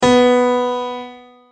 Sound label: B3 piano; notes